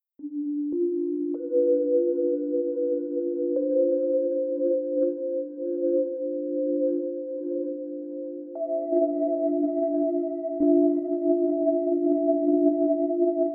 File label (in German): Qunexus pitchbend klaviatur 1 haendig